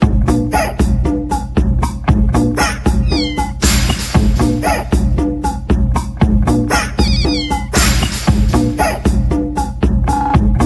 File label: jingle map